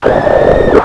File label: attack